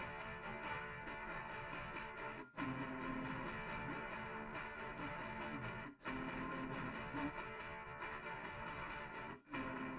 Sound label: drums live rec 250ms 1m; SiSEC11; underdetermined